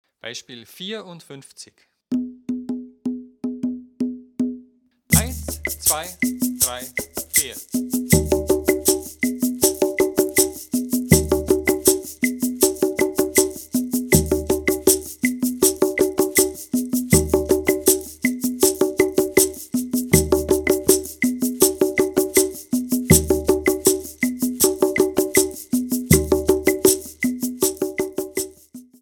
viererrhythmus

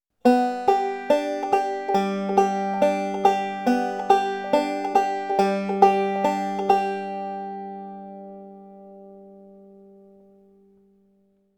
alternate picking mit wechselbass

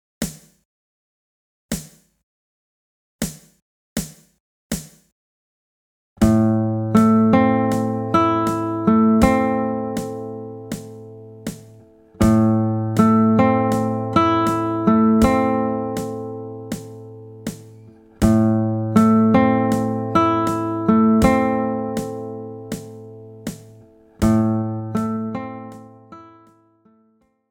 rhythmus langsam

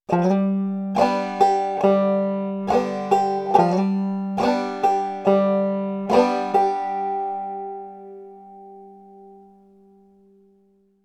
bum ditty mit slide

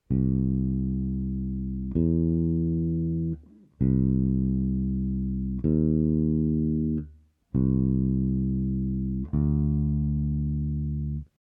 große kleine verminderte Terz

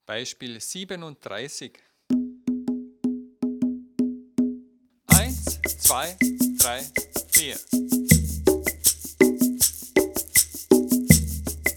viererrhythmus

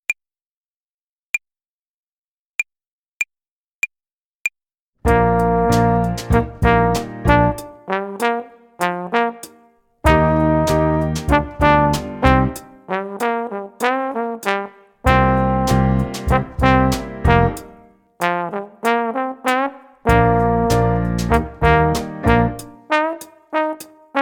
tempo und rhythmus uebung hihat play along

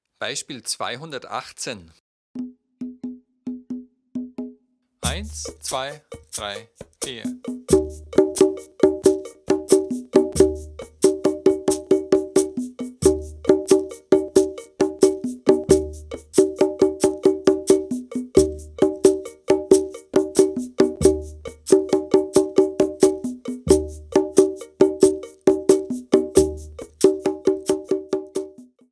dreierrhythmus

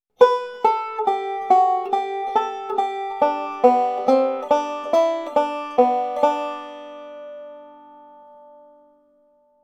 Melodie turkey in the straw transponiert

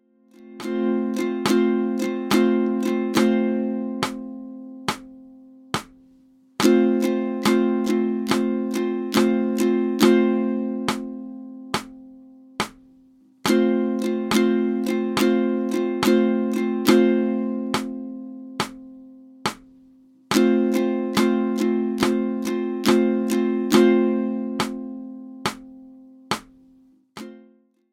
triolen shuffle swing

